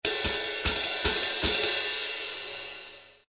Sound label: 148 jazzy rides; 141 to 160 bpm